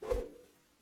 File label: Arrow Whoosh